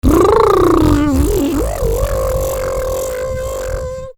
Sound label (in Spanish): friccion